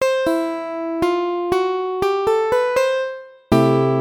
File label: outro; アウトロ（エンディング）